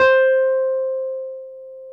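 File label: Wurlitzer electric piano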